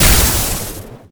Explo Small Electricity; Destruction

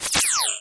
Menu Scifi

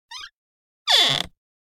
Door - Wood Creak Short Small; Hollywood Edge; sound designer's toolkit v2

